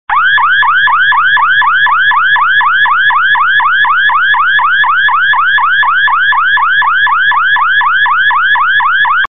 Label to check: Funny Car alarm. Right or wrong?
Right